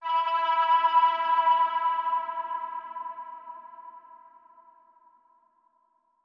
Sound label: Chime; chime sharp